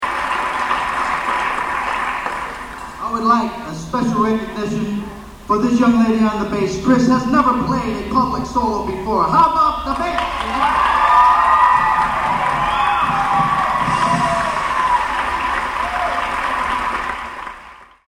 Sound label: how about the bass; i would like a special recognition for this young lady on the bass... chris has never played a public solo before! how 'bout the BASS!!